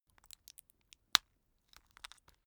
plastic drug blister press out long

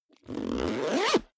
zipper backpack close accelerate

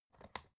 folder lever arch open

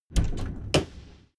train intercity train door open

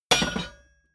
hammer hit metal

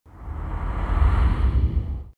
deep movement swoshes

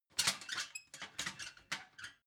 ladder metal handling

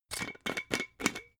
glass bottle massive open

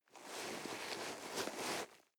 nylon leather soft

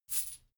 chain mail armor movement

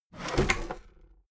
desk drawer open heavy

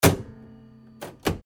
fx click

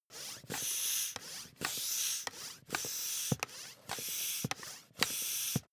air pump ball sequence fast

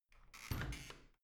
washing machine open soft

